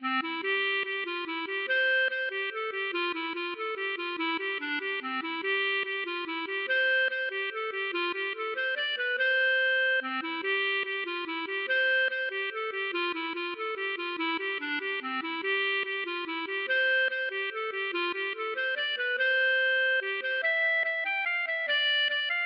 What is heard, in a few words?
mazurka